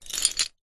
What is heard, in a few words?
ancient game metal rack gear slide mechanical gear mechanism accent